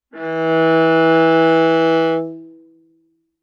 Viola arco ff sul C E3 stereo; MIS Pitches - 2014; Strings

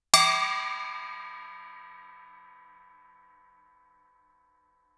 chinese stick bell ff stereo, Suspended Cymbals; Percussion

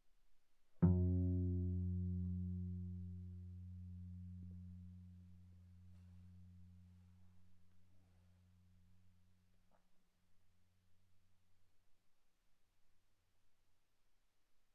Viola pizz sul G mf G3 B3 stereo

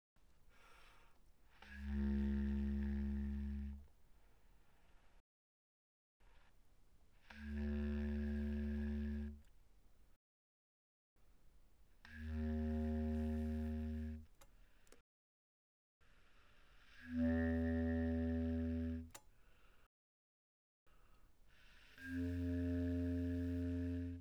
Bass Clarinet pp Db2 C2, woodwinds

